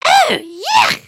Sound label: thumbs down; emotes